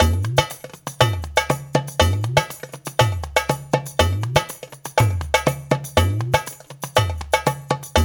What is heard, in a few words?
Dara Dance, Darbuka; Spectrasonics; Supreme Beats; World Dance